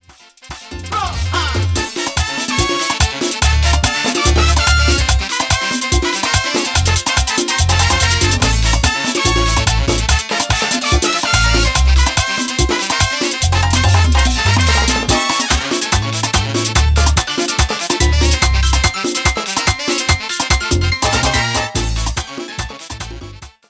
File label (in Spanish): musica merengue